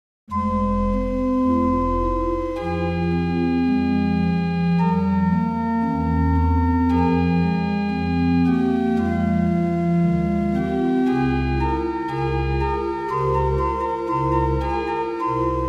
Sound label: organ simu